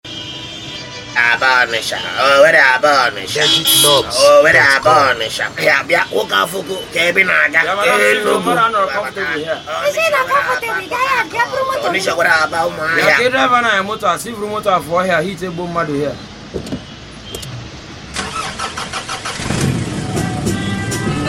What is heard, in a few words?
ACAPELLA INTRO